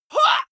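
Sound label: female combat attack short, screams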